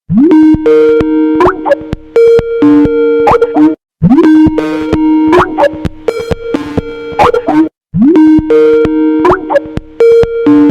Skype

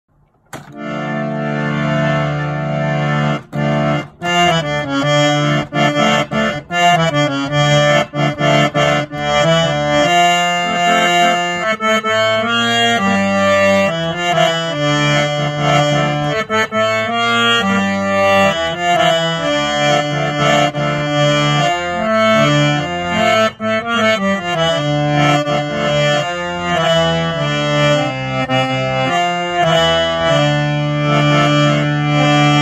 Harmonium

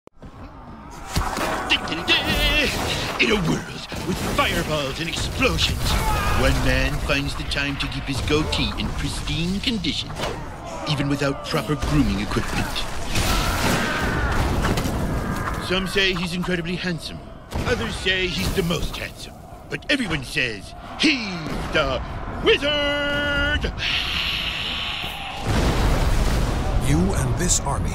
Wizard, کلش اف کلنز

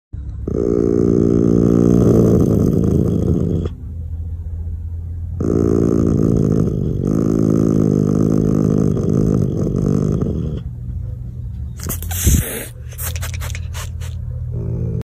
pallas's cat

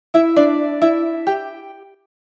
notification

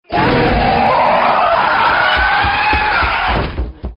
growling; pennywise

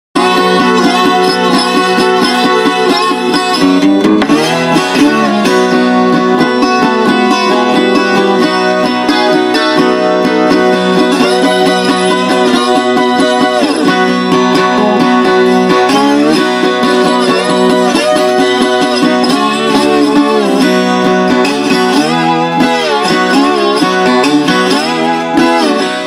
Laúd

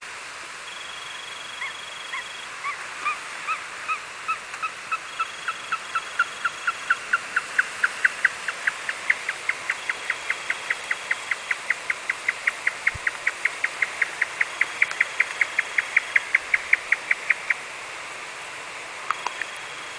Marsh tapaculo

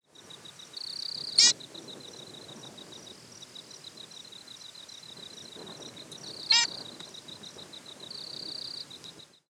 Orange chat

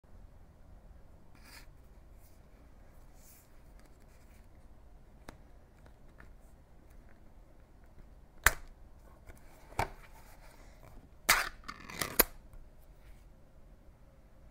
canned, باز کردن کنسرو

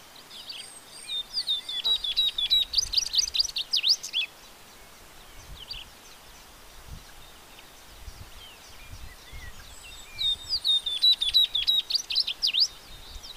Tropeiro seedeater, پرنده دانه خوار تروپیرو

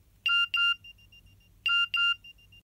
SMS ringtone

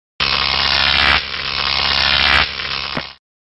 Electric medical chair

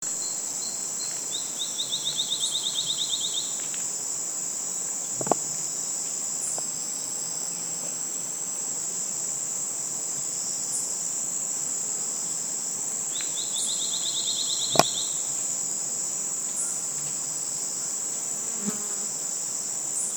Rose breasted chat

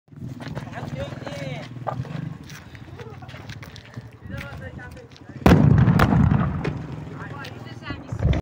Carbide; کاربیت

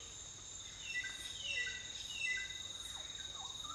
Spangled drongo; پرنده بوجانگای پولکی

